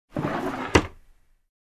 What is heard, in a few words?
Sliding Door close